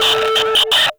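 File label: Military Backpack Shutdown; Objects; Storage Container; Warframe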